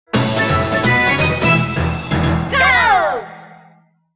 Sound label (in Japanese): Yeah！（ラウンドクリア）; All Target Cluster